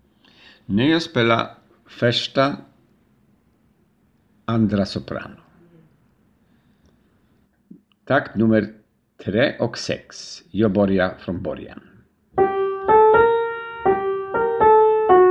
sopran

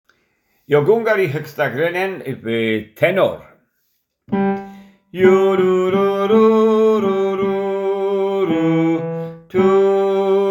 tenor

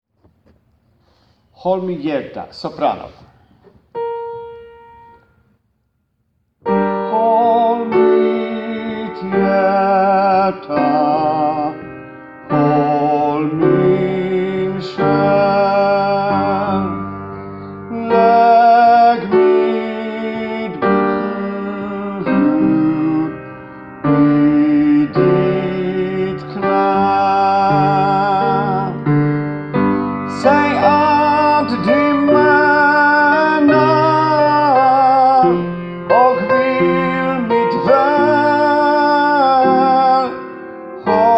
sopran

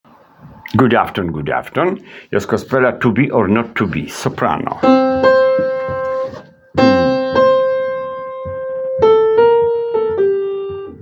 sopran

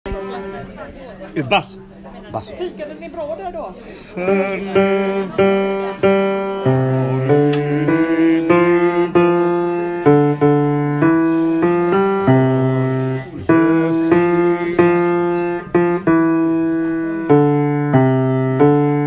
bas

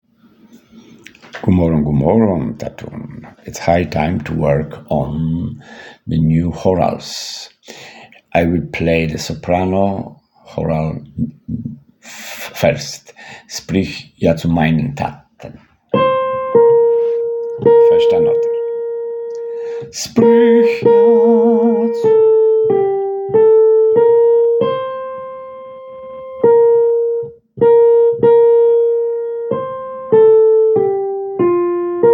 Choral sopran

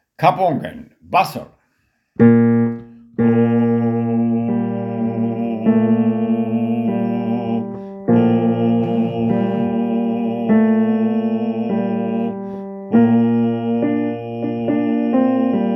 bas

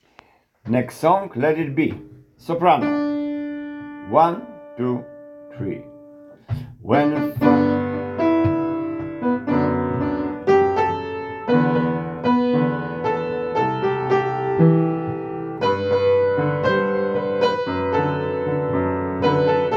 sopran

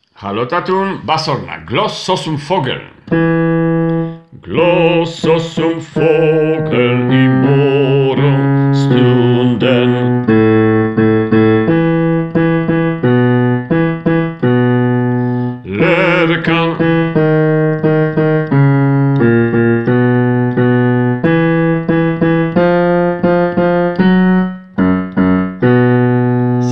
bas

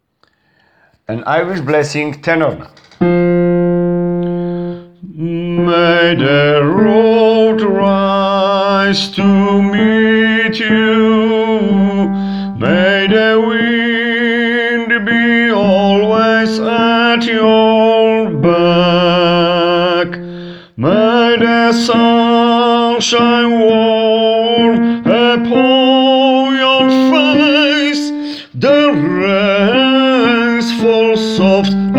tenor

